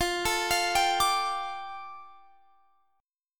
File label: F 6th Add 9th F down Guitar Standard, chord; F6add9 arpeggiated